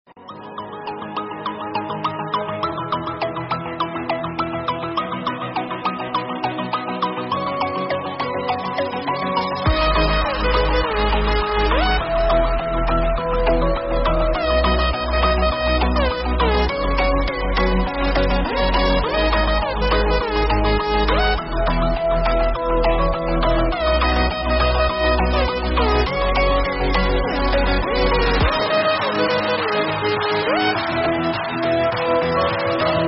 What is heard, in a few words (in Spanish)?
musica; roblox